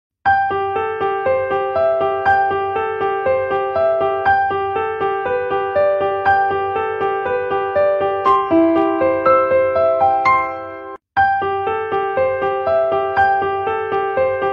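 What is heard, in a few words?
Railway, 着信音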